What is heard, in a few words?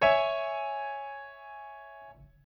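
C sharp minor higher, chords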